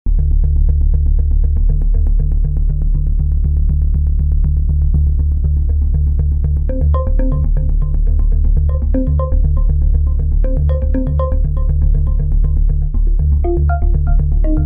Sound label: Waldorf Quantum